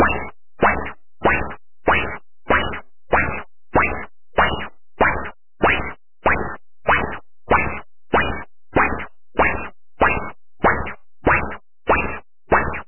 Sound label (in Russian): obrashenie zvuka